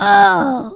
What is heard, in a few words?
death; QUAKE2; female